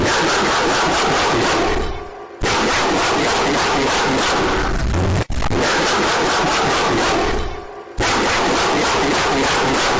dog barking extremely loud earrape

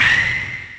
punch; smash bros

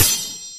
deepwoken parry

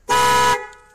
car horn beep beep

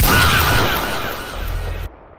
faaaa w pump shotgun

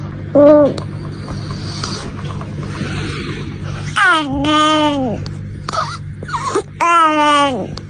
cat; on gang

